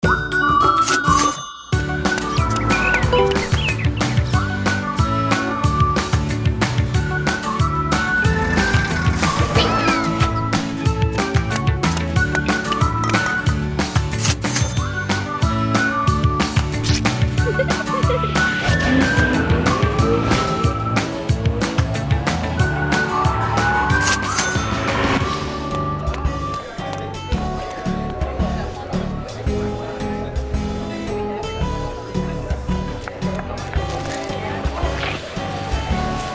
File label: Cartoon Sound